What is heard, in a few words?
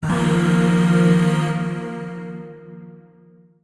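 Void Shawzin Pentatonic Minor Chord; Warframe; Zariman; Chords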